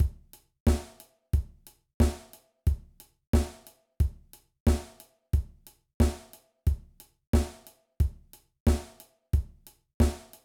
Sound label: Basic Drum Beat